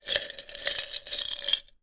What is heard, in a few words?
broken glass pieces inside glass jar movement; zapsplat